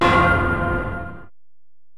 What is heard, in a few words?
Bell, Alesis DM-PRO